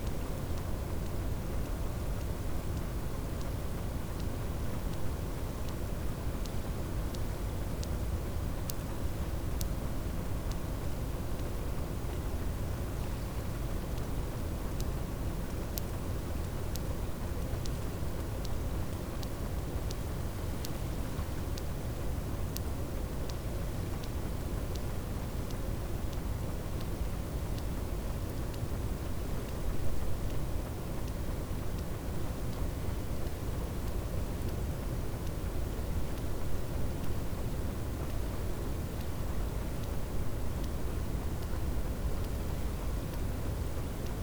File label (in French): cachalot positif, Physeter Macrocephalus